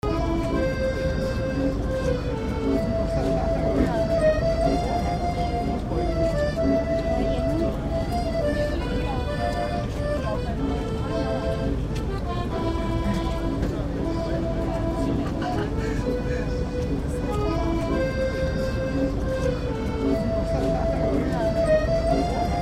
atmo germany berlin accordion player; friedrichsbrucke

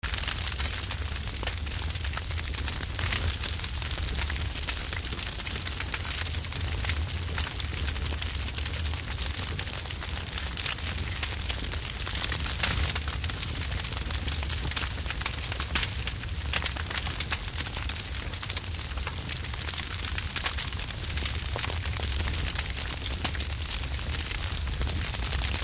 atmo fire fast crackles

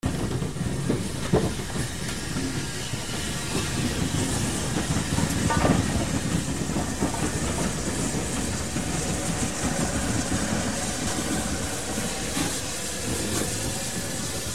atmo steampunk large engine and machinery

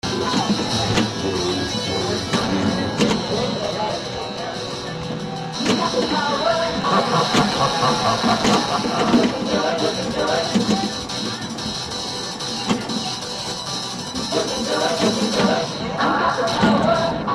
pinball hall; pinball hall of fame; black knight 2000

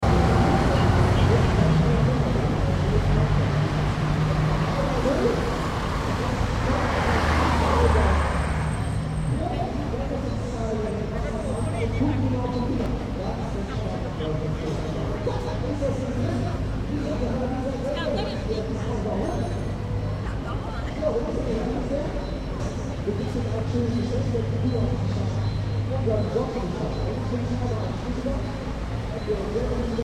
atmo germany berlin breitscheidplatz

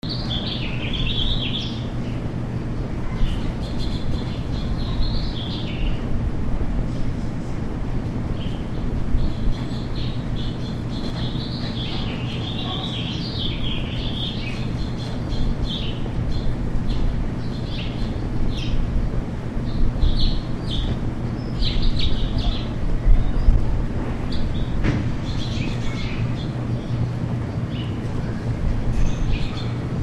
harbor pier birds; port san luis

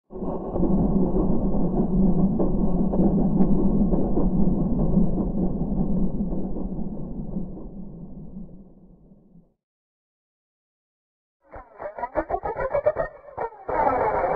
scifi mechanics set

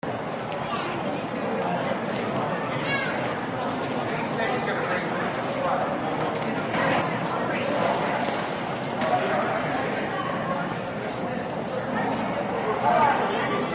atmo steampunk train station medium sized

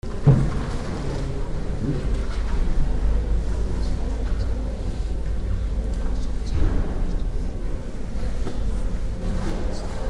religious german church crowd

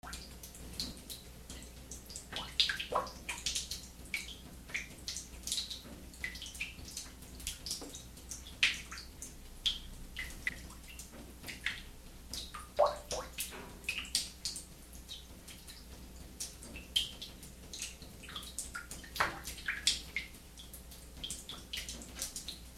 underworld metal pipes

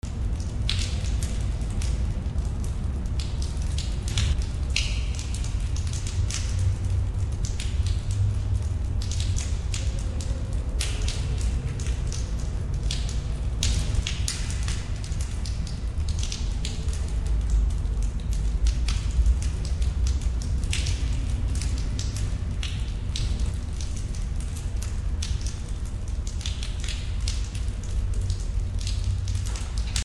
atmo underworld windy cavern

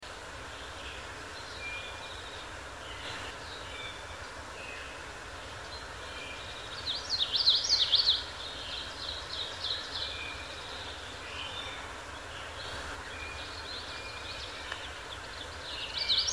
atmo birds whippoorwill

